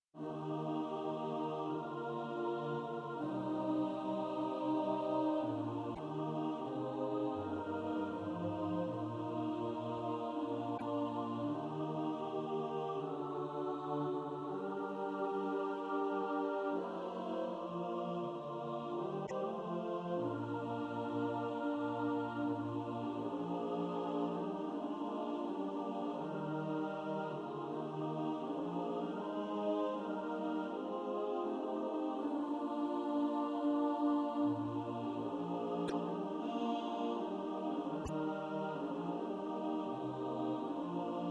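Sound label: alto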